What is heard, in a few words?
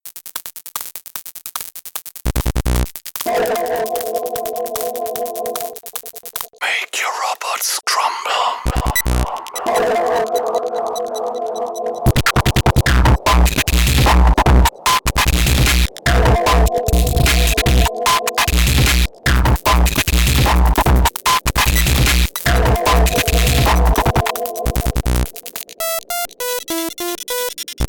crumbling robots